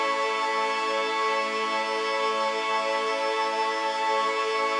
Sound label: Pad Loop A, shimmer and sparkle